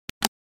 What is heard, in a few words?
Sharp EL 8 old calculator single button press; gfxsounds